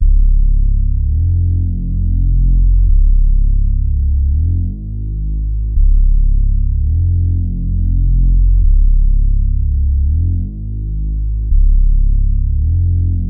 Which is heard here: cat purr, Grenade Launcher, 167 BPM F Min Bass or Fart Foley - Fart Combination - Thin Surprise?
167 BPM F Min Bass